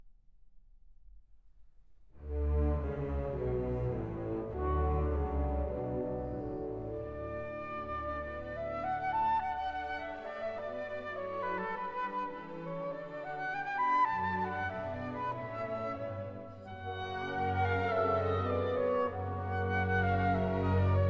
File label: Flute Concertino, Orchestra